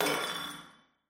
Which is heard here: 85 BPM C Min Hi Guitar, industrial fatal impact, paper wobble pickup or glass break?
glass break